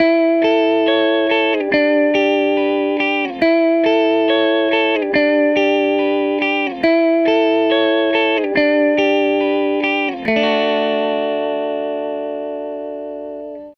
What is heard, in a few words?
Les Guitar Loop